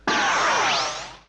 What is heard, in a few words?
laser